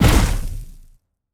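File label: Rocket Start; raw game audio files; MiSide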